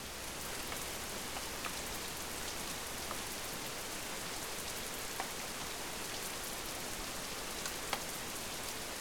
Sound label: rain loop heavy; sonniss gdc